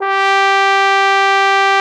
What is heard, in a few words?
G4, TROMBONE; Xsample VOL-3